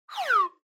Slide Whistle Overtone Down

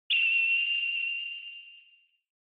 Retro Anime Accent Mid Long

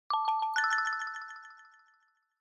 Smartphone UI Sound Dry

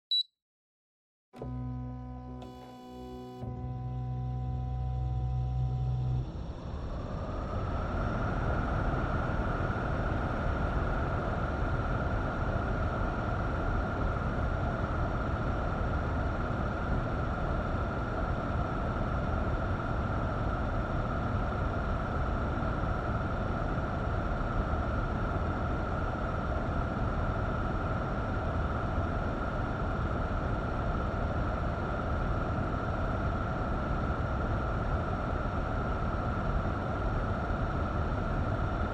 Air Conditioner Low

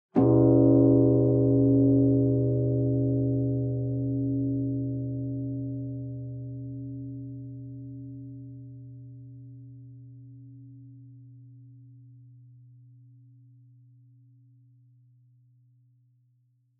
Bonsho Low

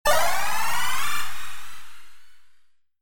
Magic

